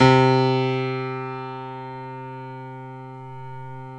9FT GRAND; OMI - Universe of Sounds

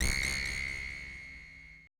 AMBI FX

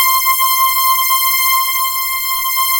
JUP6 BUZZ; Combo 1; E-MU Formula 4000 Series Vol. 2 – Techno Trance

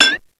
GLASS, ELEC PRCS; East West; Global Sounds

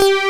FAT Z BASS; Pro-Rec - Synthsonic; Z1 DANCE

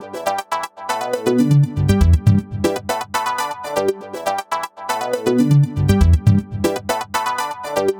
Progressive Synth, Trance

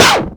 DIRTY, SNARE

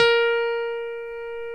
ELEC GRAND; OMI - Universe of Sounds

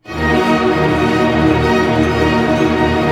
ARP D#, STR ARP MIN; Sound On Sound - Peter Siedleczek's Orchestra

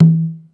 Conga Low, Ensoniq ASR-X Pro

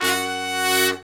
BRS SHRT, R&R Horns, Sect; Roland L-CDX-03

